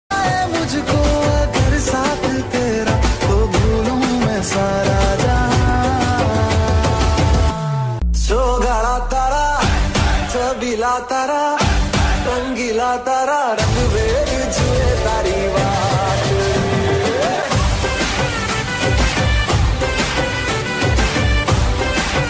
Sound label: best romantic ringtone; loveratri